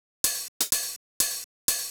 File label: HOUSE BEATS 125